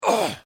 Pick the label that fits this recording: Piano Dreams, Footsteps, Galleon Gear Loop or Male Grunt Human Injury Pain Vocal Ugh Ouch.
Male Grunt Human Injury Pain Vocal Ugh Ouch